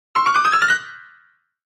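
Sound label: Grand Piano Fast Comedy; keyboards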